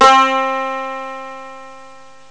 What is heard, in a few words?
saz, arabic